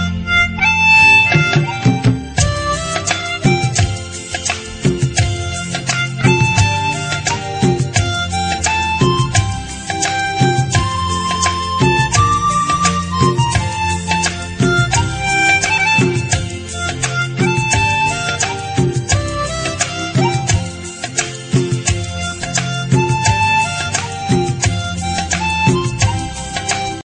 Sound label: raksha bandhan instrumental